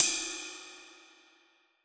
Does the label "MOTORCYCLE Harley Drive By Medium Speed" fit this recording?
No